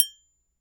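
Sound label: Triangle Mute; Roland SPD-S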